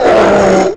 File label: pain, player; QUAKE2; cyborg